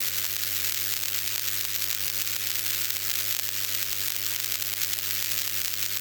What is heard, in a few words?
accumulator working; factorio; Factorio 0.14.21